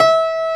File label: STENWAY; East West; Global Sounds